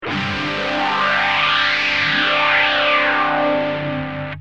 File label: gtr mam rs3